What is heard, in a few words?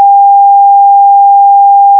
sine